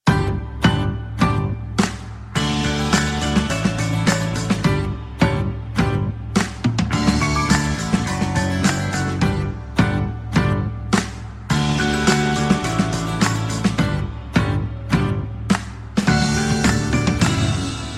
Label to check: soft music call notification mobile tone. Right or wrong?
Right